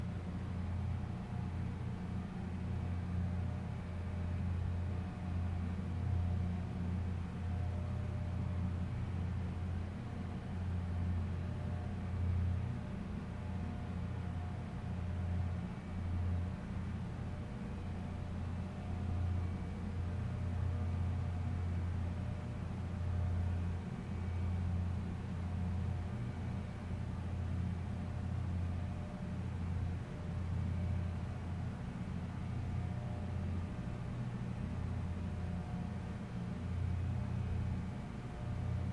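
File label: distinct big booming noise of factory work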